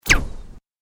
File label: Sweep Mechanical Fast; Created sweeps, swooshes and other movement effects; Sweepers; Sound Design